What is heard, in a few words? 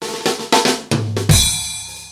Hip Hop Drum Fill